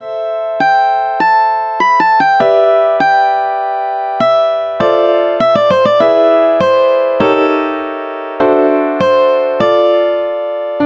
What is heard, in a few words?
Variations on the Bashkir Folk Theme Balalaika and Piano; Azamat; arr Airat Karimov